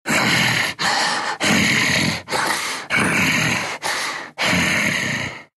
breathing, ru; Dota 2

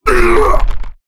Wound; battlerite; Thorn

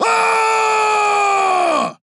Weapon; Paladins; Buck

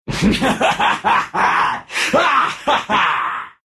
laugh, ru; Clinkz

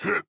Grunt Jump

